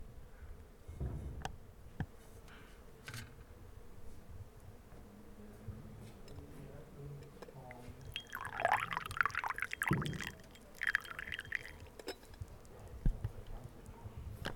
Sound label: Pouring Tea Into A Cup In Morocco